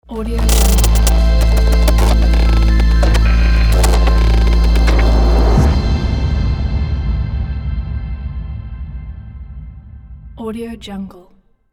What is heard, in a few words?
digital logo